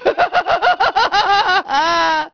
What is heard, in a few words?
crazy laugh